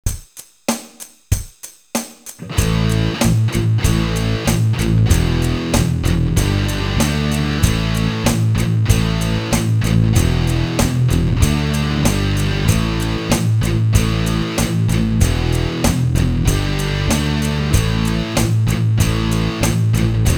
palm muting, guitar

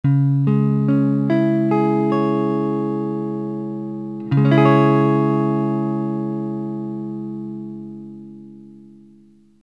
c sharp minor, guitar

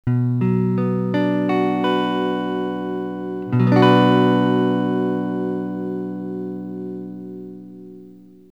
c flat major, guitar

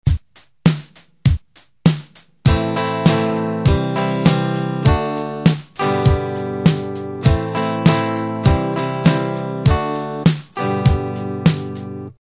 strum normal, guitar

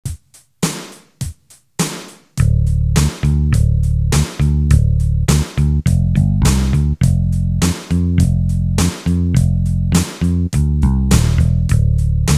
backing, guitar

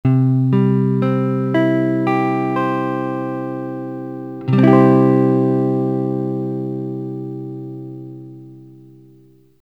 c major, guitar

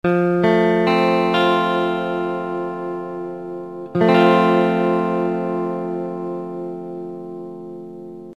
f major, guitar

